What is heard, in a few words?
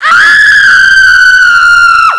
scream; baseq2